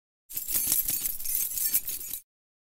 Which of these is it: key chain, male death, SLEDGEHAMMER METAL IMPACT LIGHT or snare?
key chain